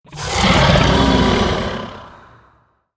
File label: Skeletal Dragon Death